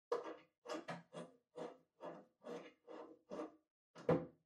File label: TV Hardware Far Antenna Cable Unplug